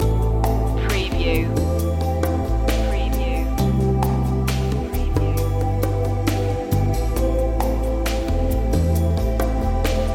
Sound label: cafe vibes loop